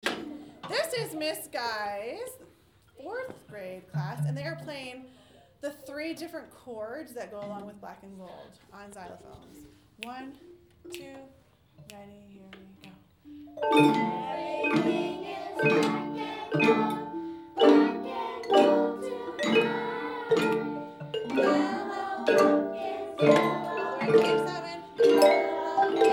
chords blocked

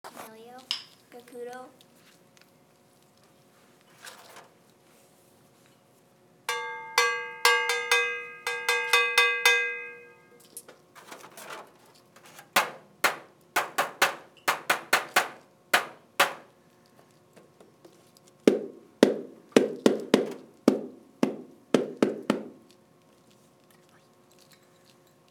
found instruments

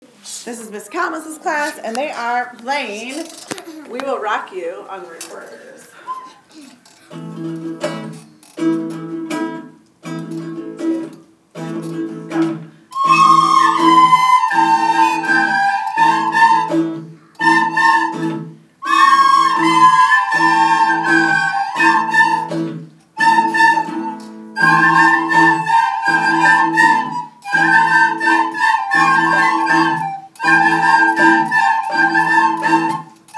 recorder

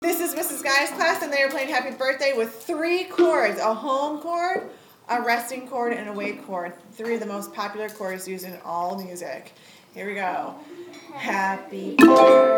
bday xylophone

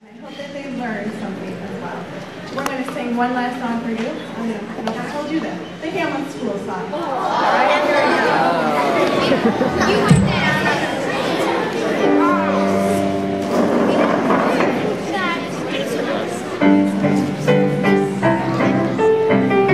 school song, choir

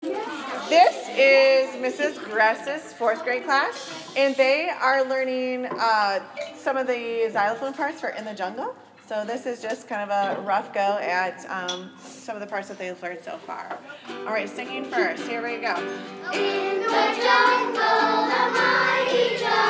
jungle xylophone

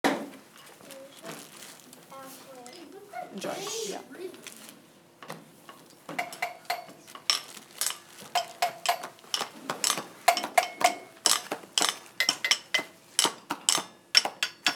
found instruments

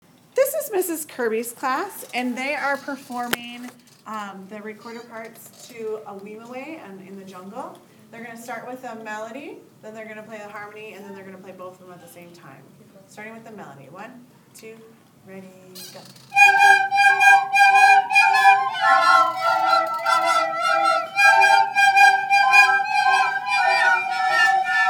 2 recorder parts

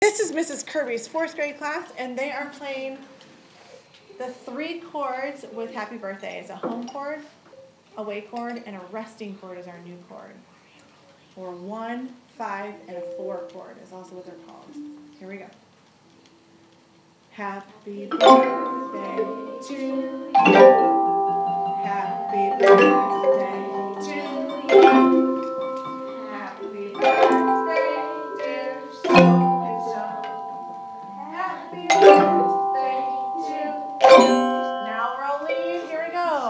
happy birthday, xylophone

